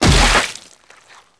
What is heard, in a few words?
cleaver hit; tf2